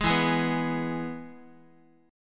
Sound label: E-Major E, Guitar; strummed; JGuitar